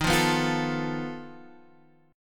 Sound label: B 7th Flat 5th Eb